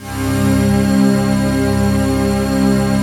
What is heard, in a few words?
PAD, Dance Mega Synth